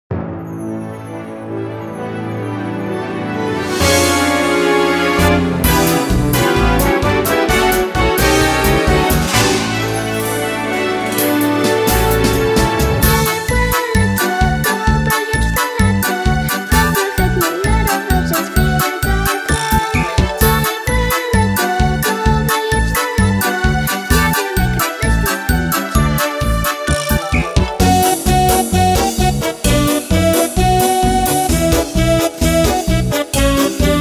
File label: Instrumental